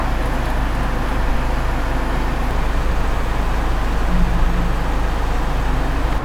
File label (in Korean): 엔진소리